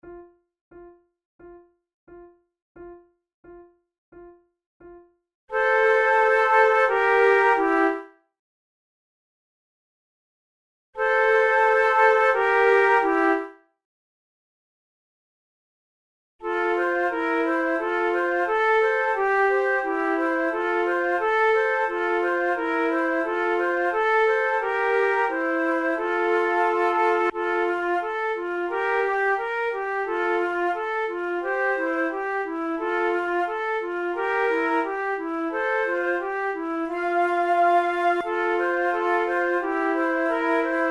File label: trio